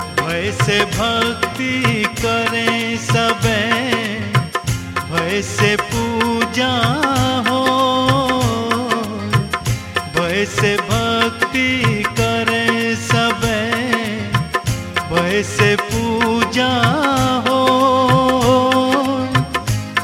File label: Bhakti; Kabir